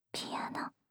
ピアノ, ASMR; 無声音ASMR; シャッフル睡眠ランダム再生用; シャッフル睡眠法で眠ろう; バイノーラル音声

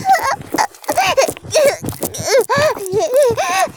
絶頂, ASMR; 騎乗位

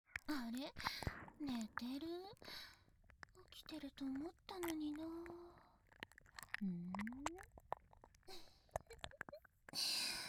耳舐めされながらナースの彼女に手コキ・フェラ・ベロチュー・オナホコキされる音声; 【寝ながらイける】寸止め1時間〜いじわる射精管理ナースの終わらない淫語囁き焦らしオナサポ〜

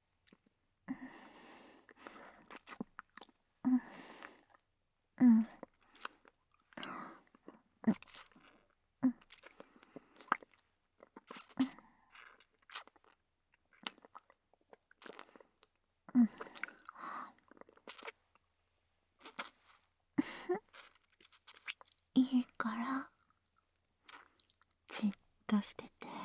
ASMR, 耳舐め; 耳舐めオナニー

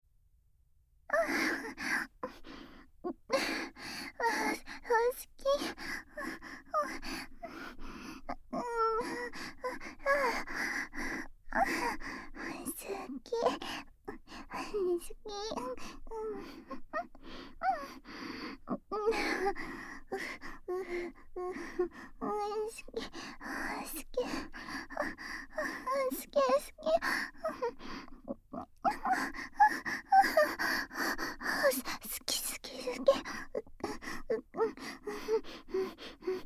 耳舐め騎乗位ループトラック, ASMR; エロ舌JKアスカちゃん; 学園耳舐め裏リフレ

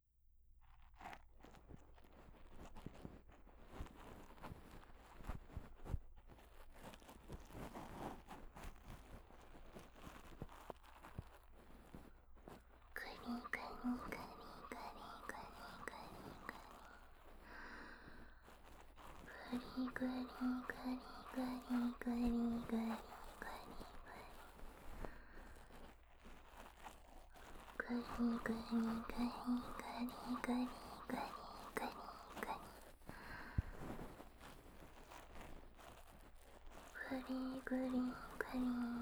耳かき梵天パートのみ

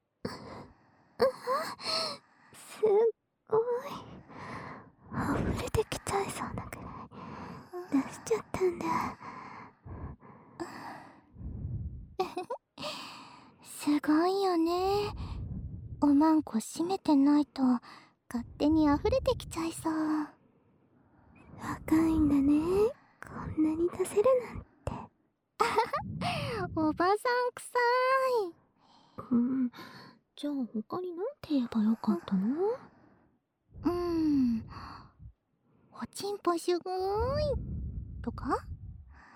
両耳舐め 歯ブラシ マッサージ, ASMR; 両耳舐め乳首歯ブラシマッサージ; プールサイドで性癖歪ませ計画～プールに入る度、思い出して勃起する身体にしてあげる♪～

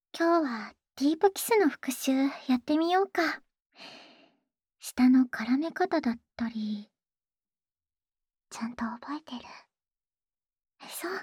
ディープキス, ASMR; オナサポ用; 青春べろちゅー委員会♪～唾液も舌も絡めまくり!最高のキステクで口内恋愛♪

